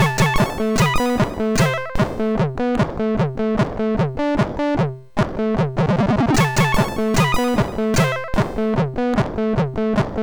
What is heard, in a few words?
MOS6581R4 sid; Dexter